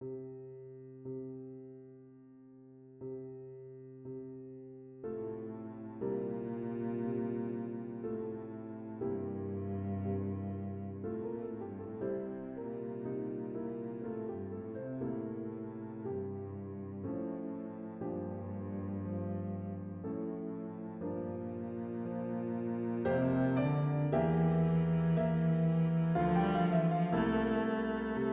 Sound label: carillon; bizet georges; arla sienne suite